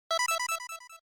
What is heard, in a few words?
SNES Shooter Select